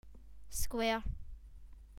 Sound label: square; 2D SHAPES